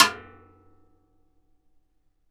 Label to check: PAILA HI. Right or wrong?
Right